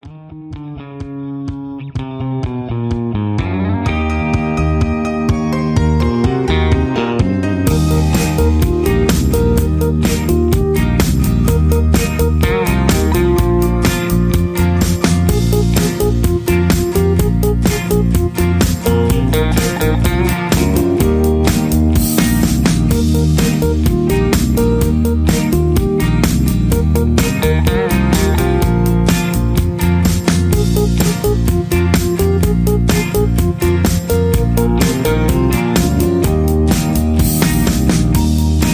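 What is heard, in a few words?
Instrumental with background vocals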